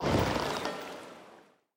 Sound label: ship sets sail